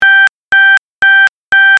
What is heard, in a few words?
Urgent Low; dexcom g4 platinum